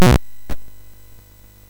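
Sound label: Petch, MOS 6581 SID chip music, games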